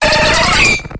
Grito de Venomoth

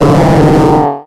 Grito de Politoed

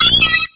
Grito de Horsea

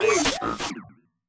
Grito de Lokix

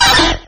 Grito de Poliwhirl

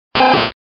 Grito de Rattata

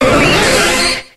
Grito de Starmie

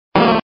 Grito de Snorlax

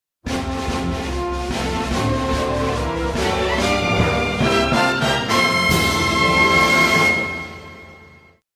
Tema de victoria; Fire Emblem; SSBB

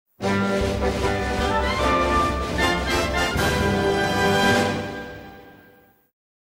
Tema de Victoria; Pokemon; SSBU

